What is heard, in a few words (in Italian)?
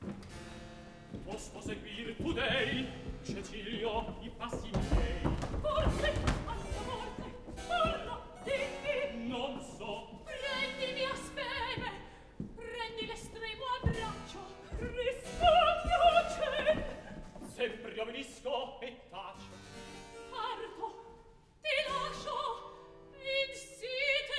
Atto terzo Recitativo; Scena 4; Aufidio, Giunia, Cecilio; Mozart; Operas; KV 135 Lucio Silla - 1772